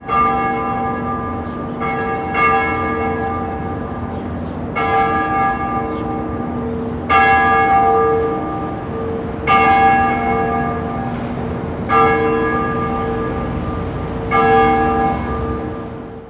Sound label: Bell, Ambience; Project Gotham Racing 2